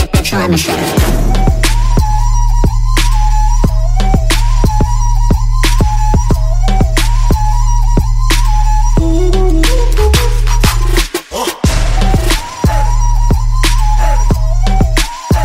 Arabic Trap

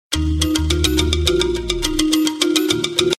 Newest Sms Tone

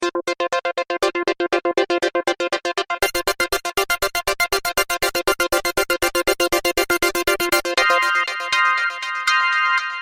Synth

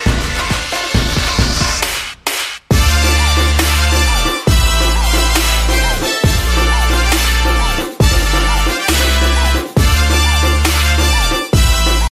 DUBSTEP; BojanFX

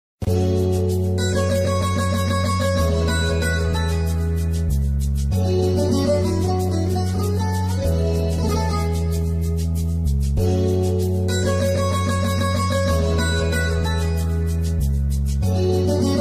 Saz

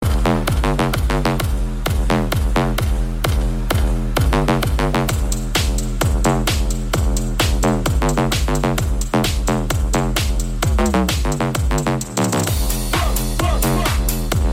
tune

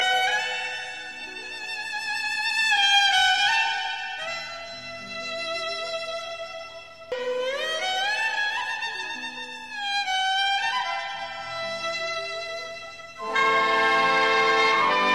Violin Piece

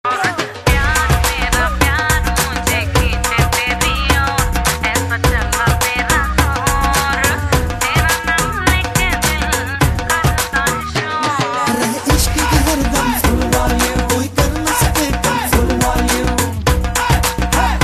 Remix

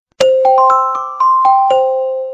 Sweet 3d Bells

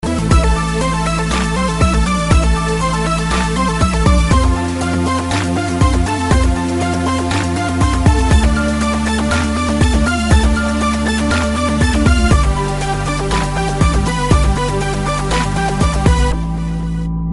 Music

